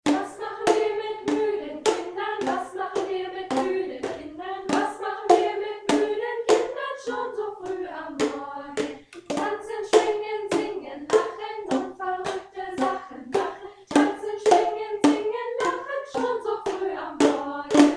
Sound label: voc boomwhackers